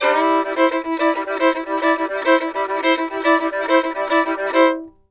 FIDDLE; FIDDLE LP105; Country

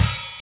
OHAT

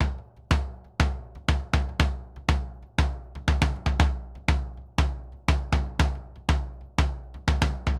Bombo Samba 120 bpm; Best Service; Festa Latina VOL-1

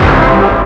STAB

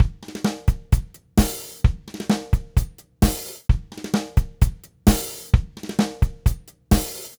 Tango, Latin Percussion, Drumset; Sonic Foundry (Sony Creative Software); Joe Vitale Latin Percussion

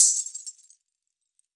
wrist, world percussion; World Instruments 2

